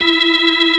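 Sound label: B3 E, organ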